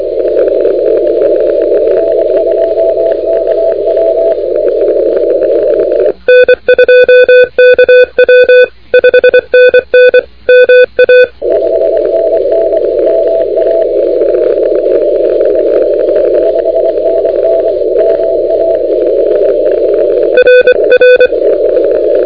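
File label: continuous wave 28MHz; ARRL10M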